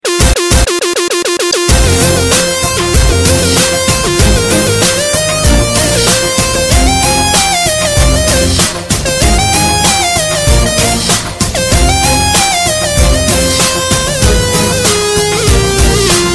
intro music

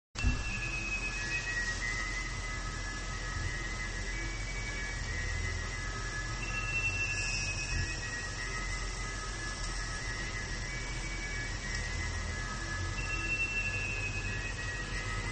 A Haunting ringtone

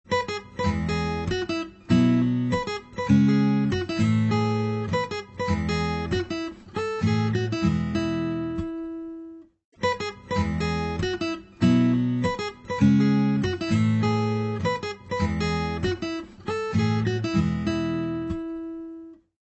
Smooth Slow Guitar

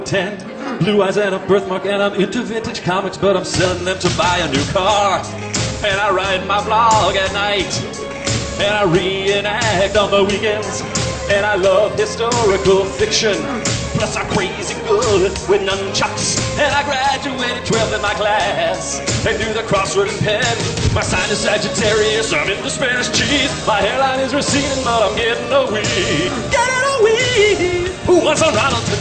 karaoke

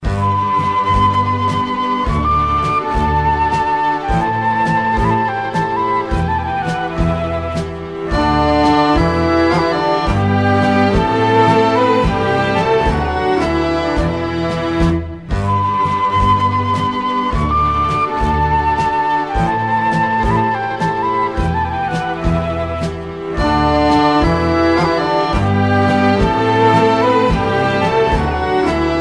serbian ethno

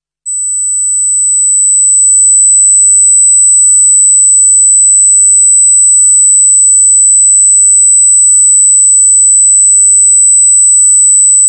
high frequncy

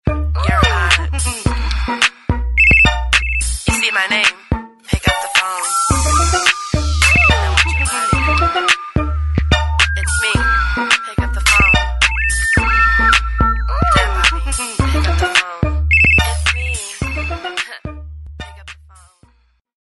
hot ring

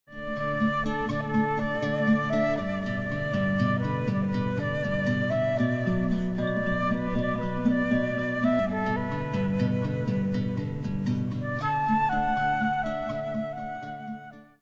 flute

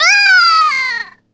Boooooooooom

